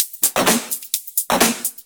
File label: Electro Loop 128BPM; Vengeance Samples